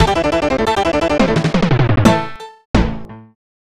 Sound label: Unused Jingle; Zool Ninja of the Nth Dimension; snes